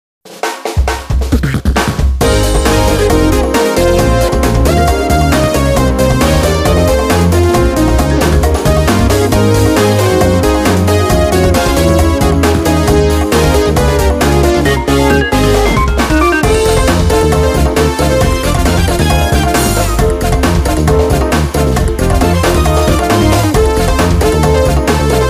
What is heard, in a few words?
Music Success event